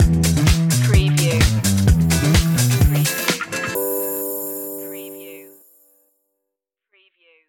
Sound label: feel good funk stinger